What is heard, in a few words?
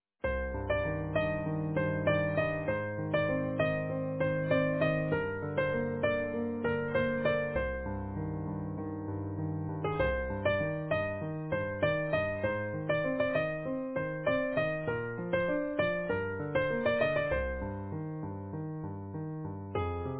easy piano